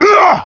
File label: Damage Fly; SSBBrawl; Lucario